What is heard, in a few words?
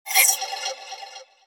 Harsh alert, notifications; Motorola Atrix-2